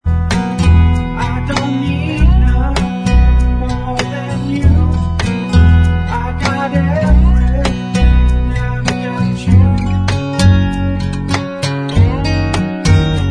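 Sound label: backing vocals, track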